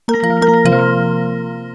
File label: need action